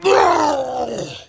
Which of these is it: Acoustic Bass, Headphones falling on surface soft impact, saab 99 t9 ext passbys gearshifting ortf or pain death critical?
pain death critical